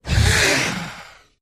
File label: Animal Stinger; stingers & whooshes